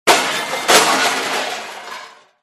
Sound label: crashes metal; Audio Mangler